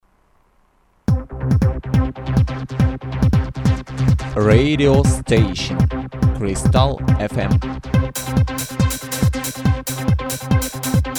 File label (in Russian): РАДИО ДЖИНГЛ ЗАСТАВКА